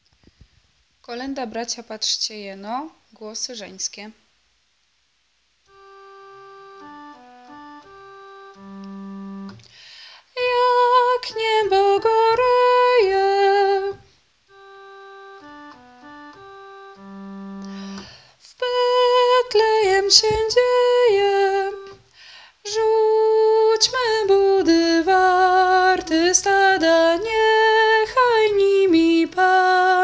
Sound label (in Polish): Bracia patrzcie jeno glosy zenskie; Boze Narodzenie